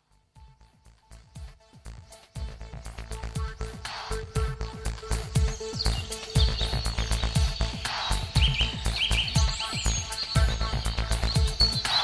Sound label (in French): celebration musique chamanique tambour